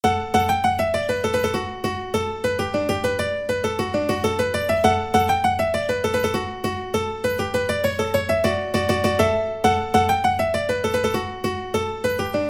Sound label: St Annes Reel, mandolin